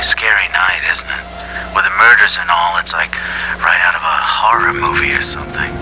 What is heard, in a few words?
Scary Night; Halloween WAV